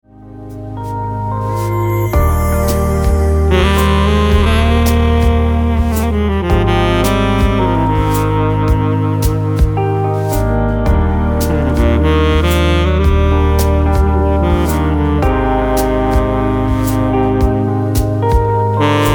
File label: Slow Jams G Sax